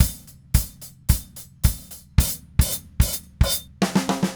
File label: Red Maple Groove 110, DW Red Maple Kit